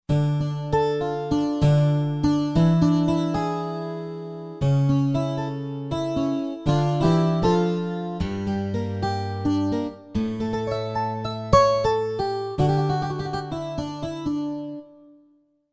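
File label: Guitar 18 Steel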